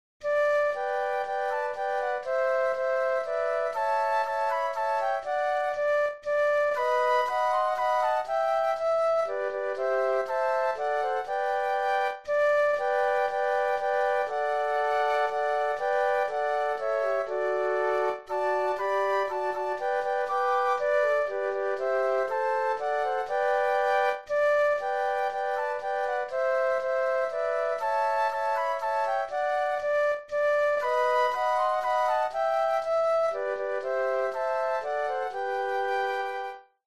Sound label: Flute Trio; christmas